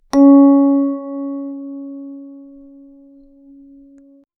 a clean dry audio recordi, handpan

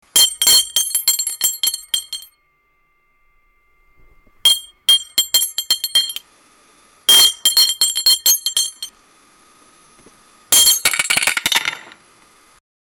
sound of choclate bars fa, machine

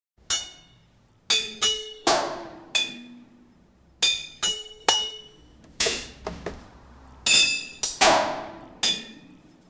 trading; minecraft; selling

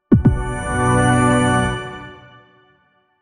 ending with light ding on, logo

